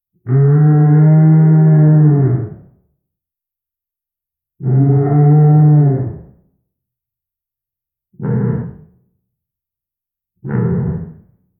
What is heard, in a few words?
a lion roar in the